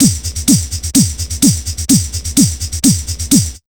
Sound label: 127 DRM, Fresh Disco House